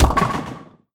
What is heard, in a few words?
smash; Ben 10 - Cannonbolt Smash